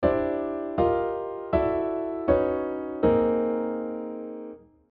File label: block chords; block chords motion